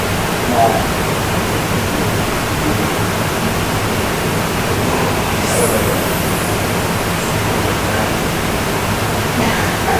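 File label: Unexplained Hissing Noise Amplified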